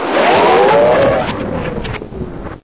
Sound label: particle suck; source engine; hl1; video games